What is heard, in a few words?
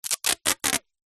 office sellotape rip